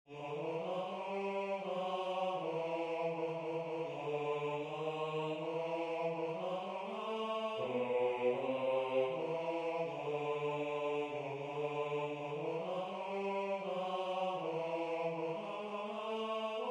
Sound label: Bass